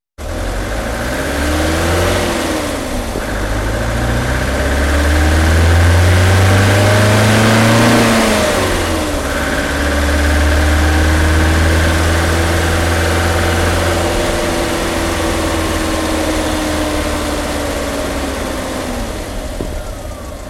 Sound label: Acceleration, Engine Sounds